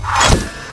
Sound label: camera; ttt